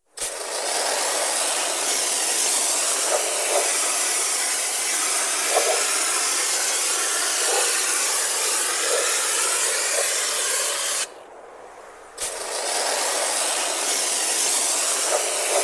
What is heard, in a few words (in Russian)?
Pulverizator raspylitel